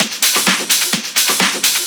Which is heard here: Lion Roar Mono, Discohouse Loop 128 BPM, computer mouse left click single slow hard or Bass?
Discohouse Loop 128 BPM